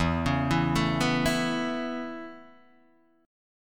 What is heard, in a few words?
A Major Add 9th E Guitar Standard, chord; Aadd9/E arpeggiated; JGuitar